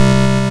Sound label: YM Chord G; ChipShop Deluxe; ChipShop Hits